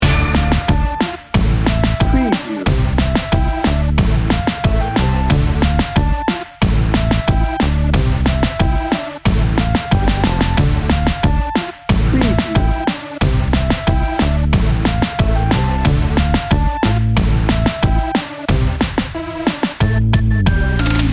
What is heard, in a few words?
reggaeton happy upbeat loop